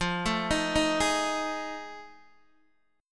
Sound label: F 7th Sharp 9th F Guitar Open D; x,8,7,9,6,6